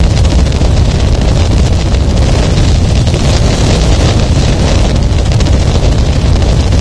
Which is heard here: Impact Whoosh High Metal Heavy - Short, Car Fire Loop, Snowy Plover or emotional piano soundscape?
Car Fire Loop